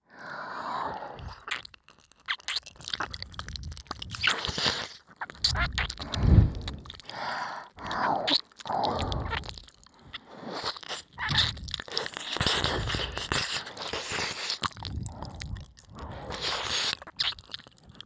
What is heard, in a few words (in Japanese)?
耳舐め 両耳; 夜のひとりごと; 耳舐めオンリートラック